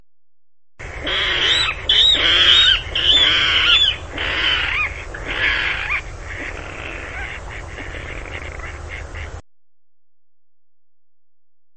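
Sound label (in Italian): Porciglione Rallus aquaticus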